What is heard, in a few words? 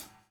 DOWN, DOWN KIT 78